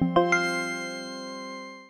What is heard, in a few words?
FX Bleep warpz